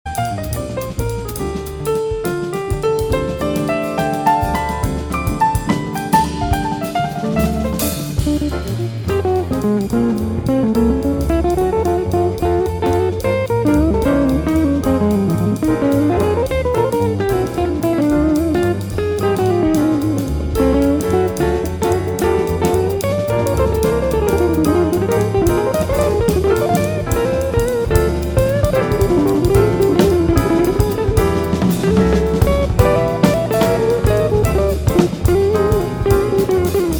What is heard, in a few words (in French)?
guitare jazz